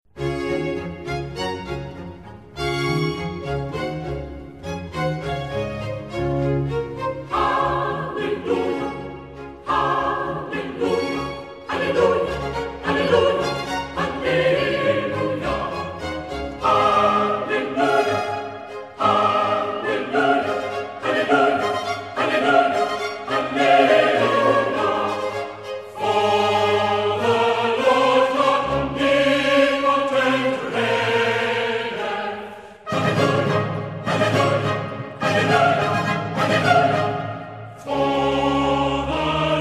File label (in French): baroque alleluia; haendel